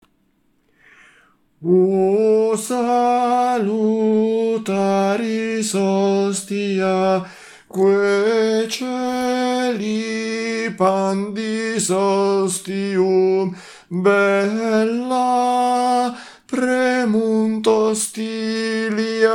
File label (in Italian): tenori; O Salutaris Hostia Perosi